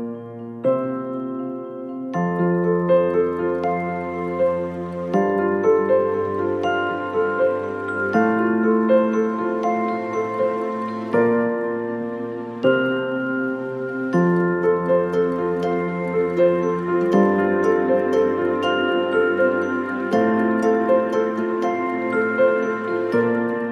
IPhone 12 Pro Original Ringtone

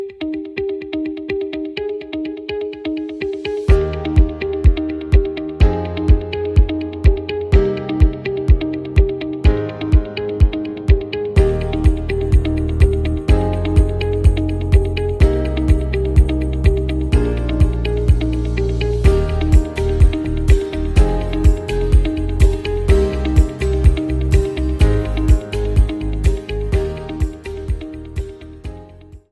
Vivo ringtone

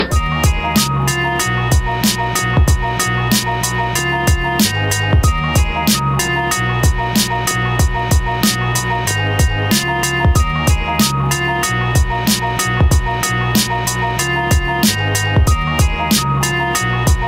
iPhone Ringtone

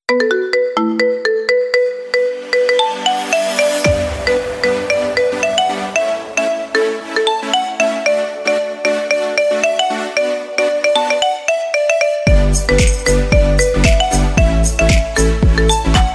Marimba Remix Ringtone

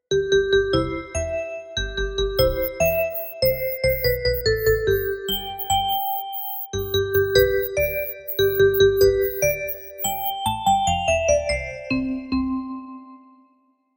Smart Ringing Tone; Vivo

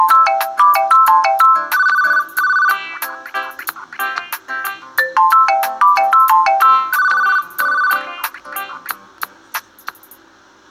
Vivo Chirrupy Call Original Ringtone

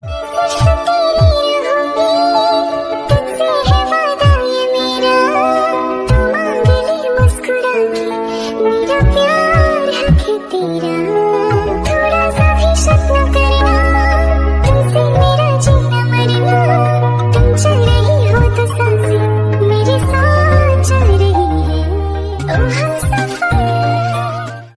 Remake Hindi Love Ringtone

